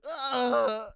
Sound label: Screaming